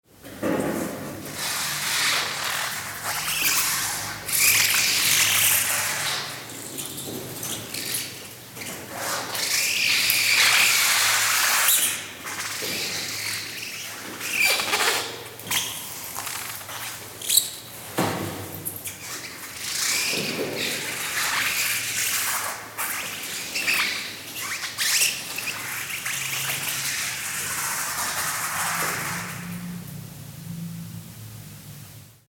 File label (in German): Quietschen Fahrradreifen